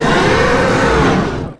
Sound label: monster